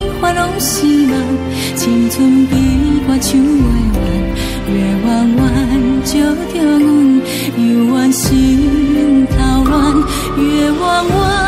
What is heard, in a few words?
c pop ringtone